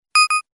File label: Disconnect; LG B470